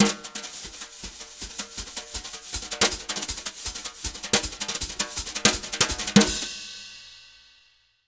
Jazz Latin Drumloops; USB Soundscan vol.08; AKAI

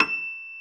E6, GRAND PIANO; InVision Interactive; Lightware VOL-1; Instruments & Percussions

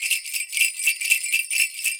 120, MIXED DRUM LOOPS 120

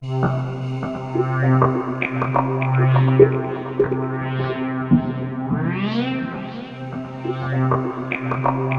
C3, SynthLine; 90 s Sample CDs; NiceBeats; SynthLine VOL-5

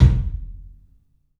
KIK, LIVE CLUB KK, Drums; 3D Sound

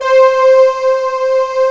PAD, SYN PAD; AKAI S6000 CD-ROM - Volume 1; SAMPLE WAVE MIXING 1

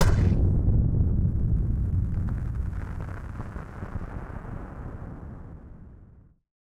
Firework FX; musicradar; bomb factory

